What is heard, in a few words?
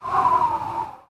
Dialog Close; Idle Champions of the Forgotten Realms